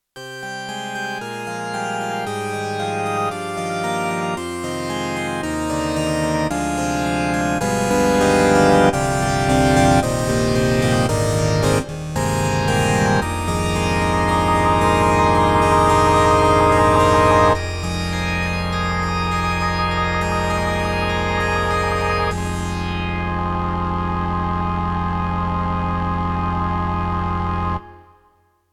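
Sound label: YAMAHA MU1000EX Synth Harpsichord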